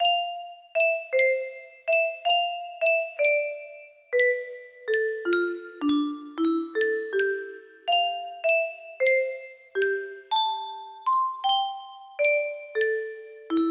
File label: music box melody